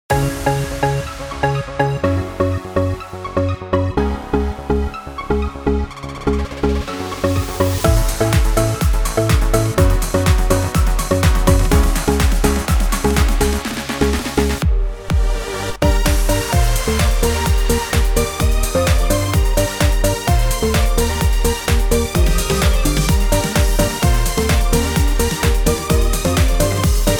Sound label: Dance; Yamaha Genos2 Demo Track